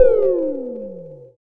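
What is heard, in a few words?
synth effect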